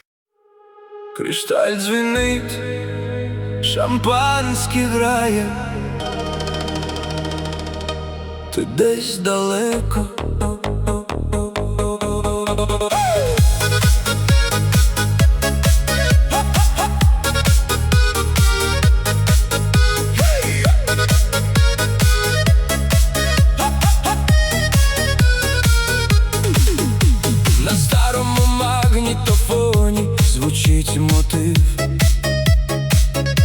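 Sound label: Ukrainian music for the car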